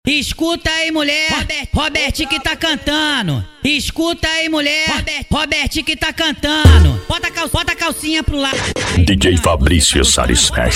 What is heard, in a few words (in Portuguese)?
carreta suprema funk